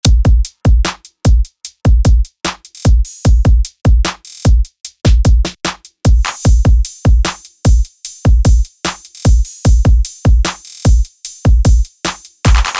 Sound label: drums; xo; Plugins für Beats, Workflow & Feinschliff